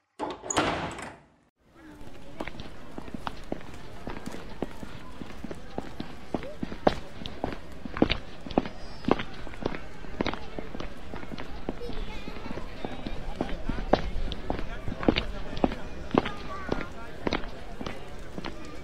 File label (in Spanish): efectos de sonido